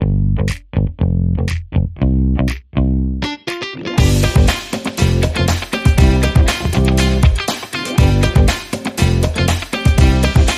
Funky

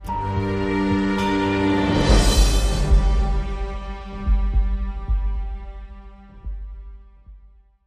Sting

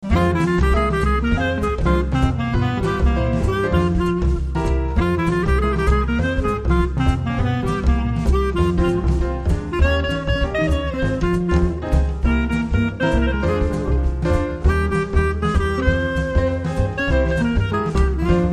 Swinging

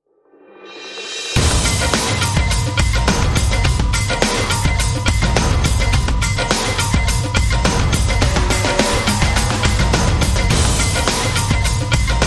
Drums Only

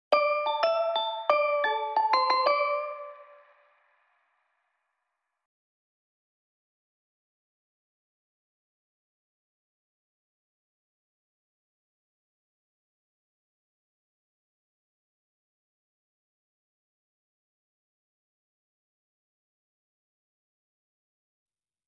Bells Brasses

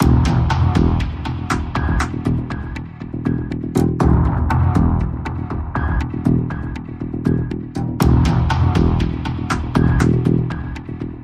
No Strings

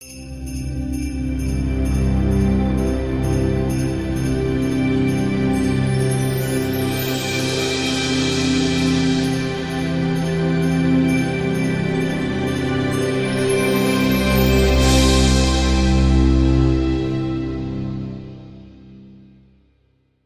Ambient Sting

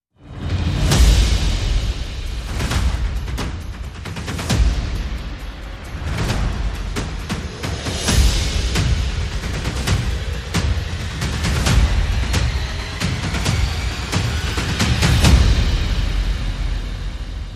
Perc and FX

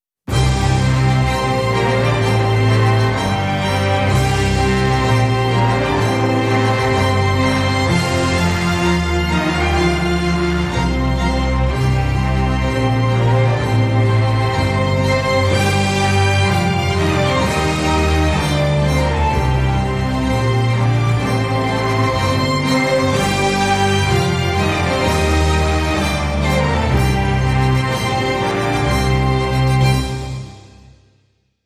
Epic Future

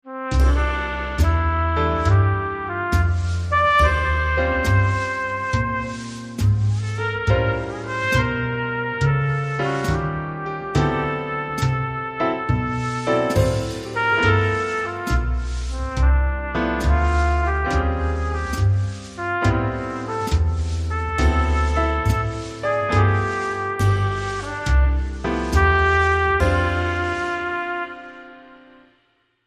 Midnight Jazz

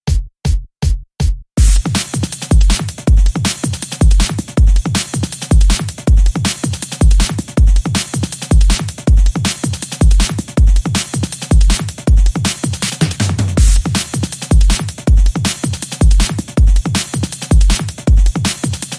Fast One Beat

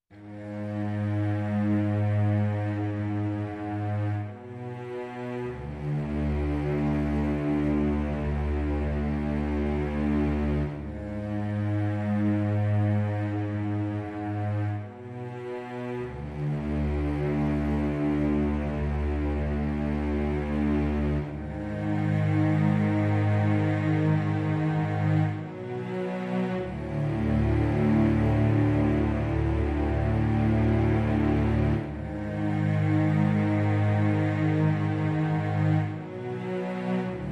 Strings Only

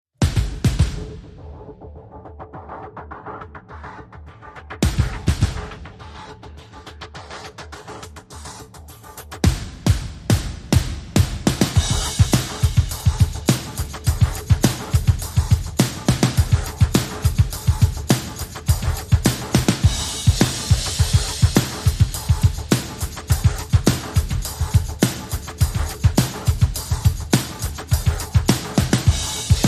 Drums Only

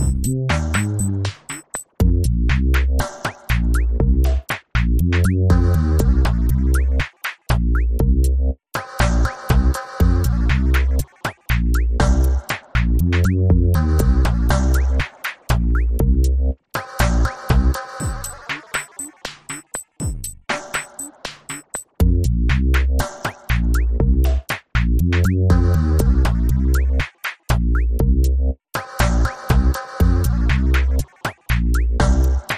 Drums Dub Dynamic

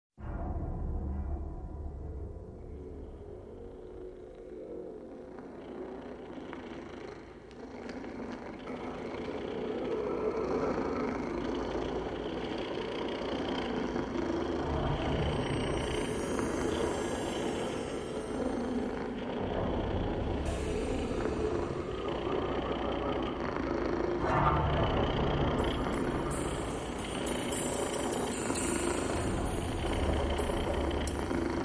Drones Only

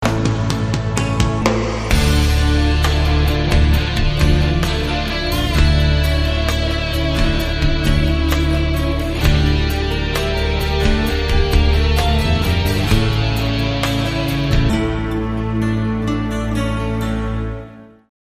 Gondole Flamenco

